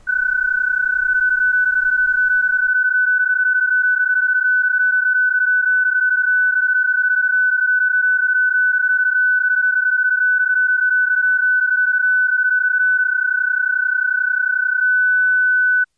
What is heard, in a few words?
PSK31; ham